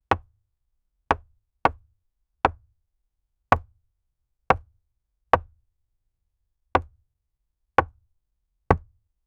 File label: three distinct gentle, door